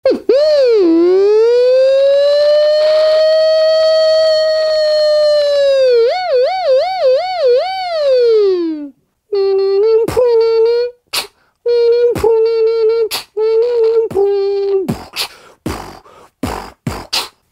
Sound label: Siren